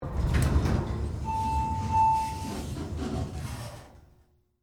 Elevator Door Open, Office, Interior; Elevators; Sound Effects:Machines

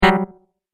Tap Wrong, button; Multimedia Sound Effects

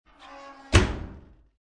Household, Dryer Door Close, Squeaky Door; Laundry

